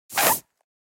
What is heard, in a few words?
Sauce bottle empty squeeze squirt air; cooking